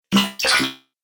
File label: Droid Wet, robot